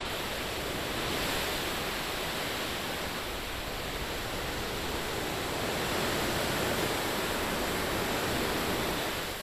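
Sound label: ocean close gentle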